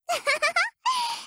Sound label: jump; m4mod